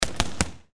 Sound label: boxing single punch